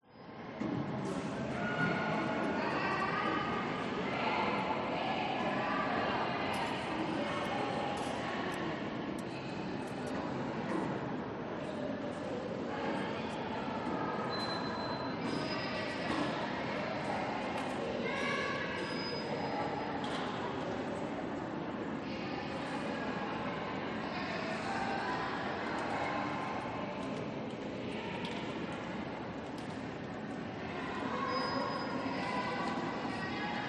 SPANISH CROWD Metro Platform 25 30 People Families; gain walkers